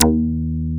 REZO BASS; Masterbits; Dance Trax

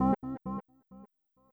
Organ C1; 132bpm; Big Fish Audio - Old Skool House; OSH Construction Kits; OSH Marshmellow

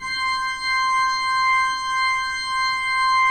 pipe organ, 3-D Audio; E-MU Producer Series Vol. 5 – 3-D Audio Collection; 3D Organs; 3D Pipes Dr Joyce

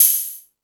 Hip Hop, Drum Machines E-MU Pro-Cussion

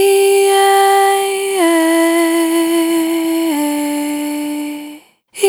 Single Voice Melodic Loops, Vocal, Dry; Sony Creative Software; Pocket Diva World Class Vocal Samples; Discovery

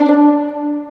STR VLN PI, String Vlns Pizz; Roland - String Master Series; Vls Pizz

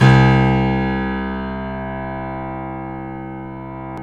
PIA GRND, Grand; Sound & Vision; Gigapack I CD 2; PIA GRAND 1

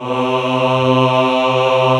MALE C2, CHO AH

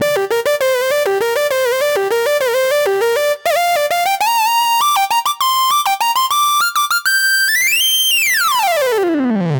Short Circuit Mel; Zero-G; Future Wave

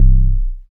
BAS FM DX-7

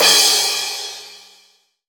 CRASH, Crash Cymbal; MONO CRASH; AKAI S6000 CD-ROM - Volume 3

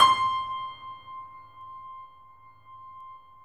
The Piano, sustain

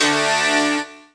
SYN Q-Guitar; Best Service Gigapack 1

